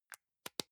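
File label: deodorant can lid close soft, badezimmer; haus-garten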